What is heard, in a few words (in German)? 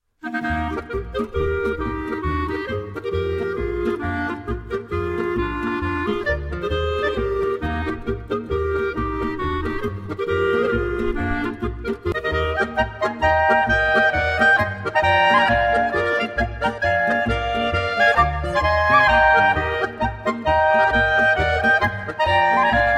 Polka